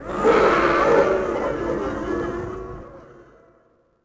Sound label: walla cheer; tusken; Star Wars Obi-Wan; XBOX